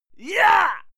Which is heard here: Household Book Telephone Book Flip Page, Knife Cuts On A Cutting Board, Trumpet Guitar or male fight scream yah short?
male fight scream yah short